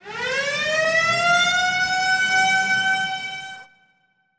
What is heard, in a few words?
Siren; Yu-Gi-Oh! Master Duel